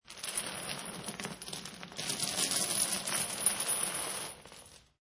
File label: Dragging Chains, Heavy chains being dragged across the floor, a fairly long distance